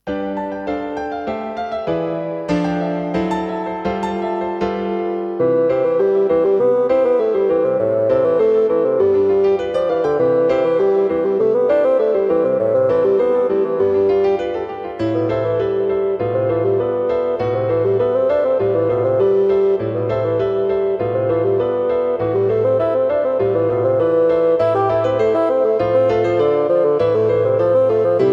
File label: Bassoon; Bassoon Solo and Piano; Not for the faint-hearted.